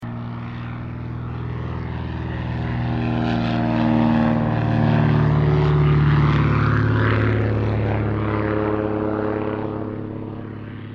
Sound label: aircraft cessna o-1 birddog pass; 1953